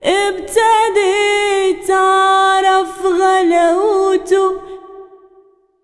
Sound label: SINGER, Arabian Traditions